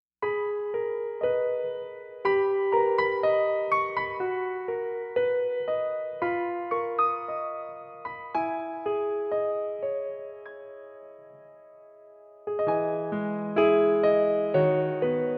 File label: Piano Ver.; 로드 넘버원 (MBC 수목드라마)